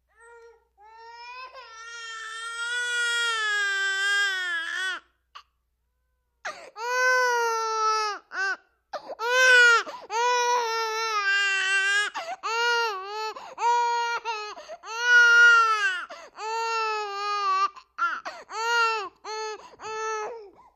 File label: Baby: 10-month-old, Crying. Interior.; Mono